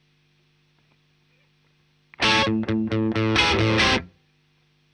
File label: Gtr; Marshal